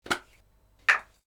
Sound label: Hairspray Can Lid